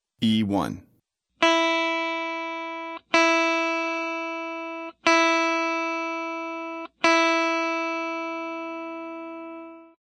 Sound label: High E string, guitar